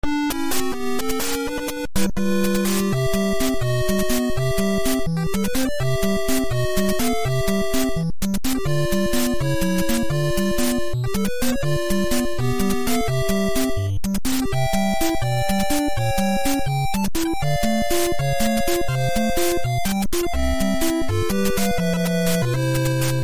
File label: Underwater (Fast); mari0